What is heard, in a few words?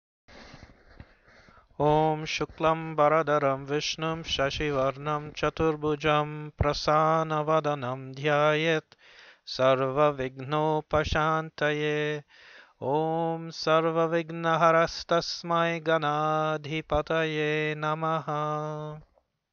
Ganesh Obstacle removing mantra